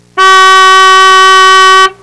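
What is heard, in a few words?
boat horn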